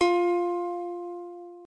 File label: F Guitar